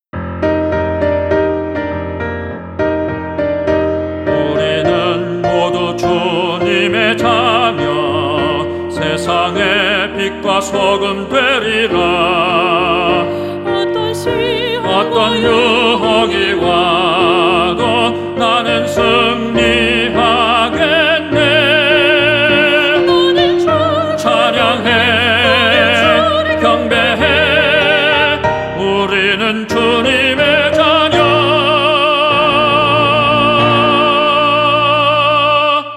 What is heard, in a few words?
TENOR